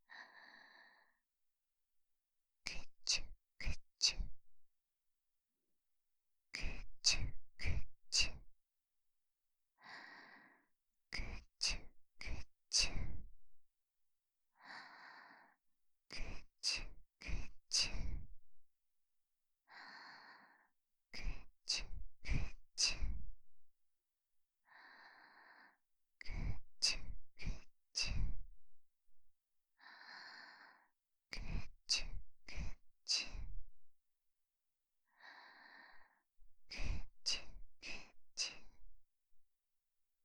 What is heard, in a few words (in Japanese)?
クチュクチュ, ASMR, 左耳